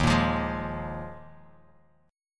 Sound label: Eb Suspended 2nd Suspended 4th Eb Bass Standard, Ebsus2sus4 chord; Ebsus2sus4 strummed; JGuitar